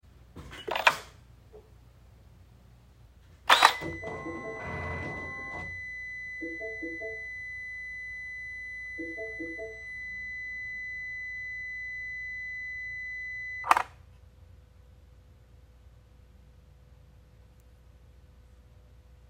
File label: r57 electrical noises; after cas repair; mini2012r57; engine off